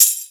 jingle bells

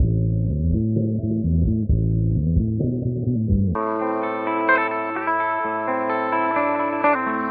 guitar lana xes

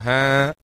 huh male, Voice

